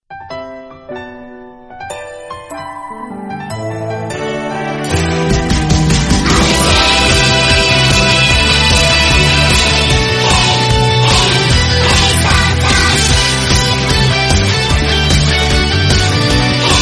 music start

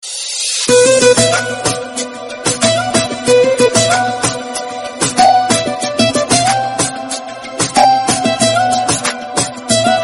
romantic instrumental turkish

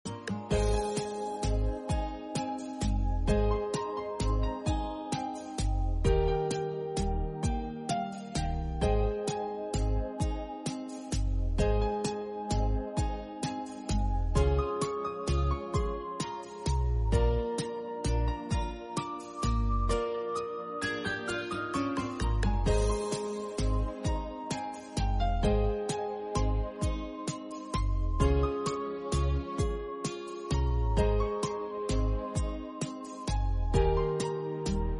instrumental guitar

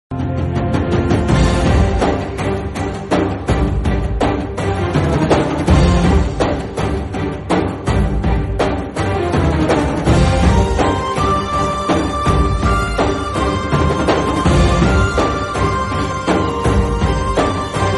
bgm; rrr

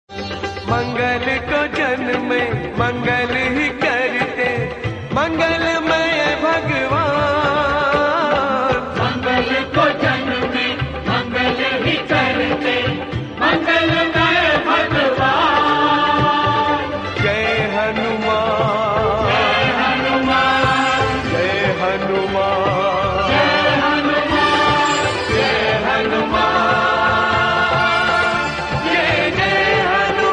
Hindi devotional; hanuman

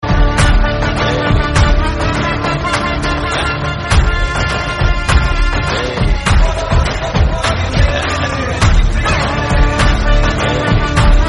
instrumental bgm; jailer; rajinikanth

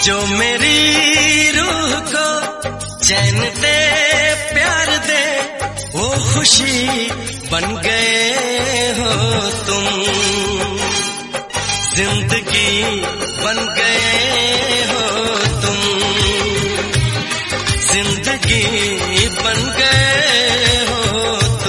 lofi song hindi song

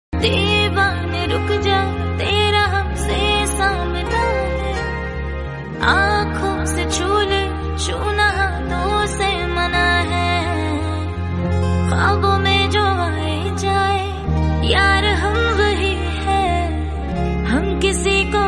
female version

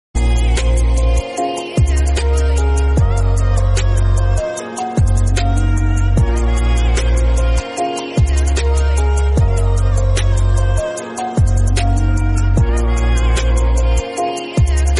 remix, instrumental, bgm; Doraemon

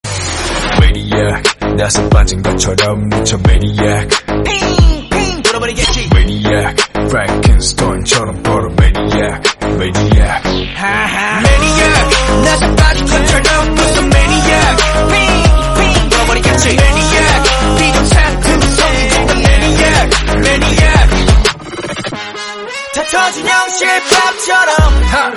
korean song

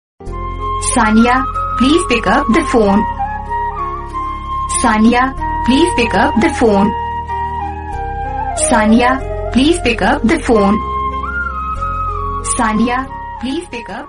phone call phone bgm

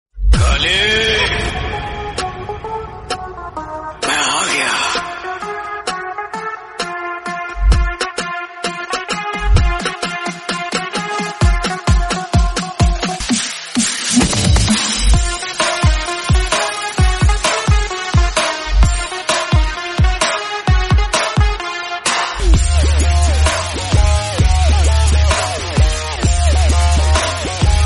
instrumental romantic hindi

